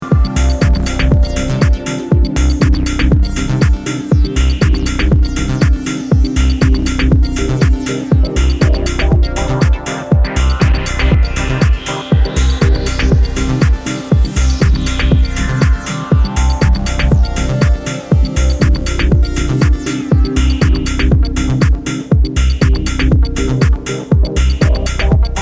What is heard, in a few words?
Analog; Fire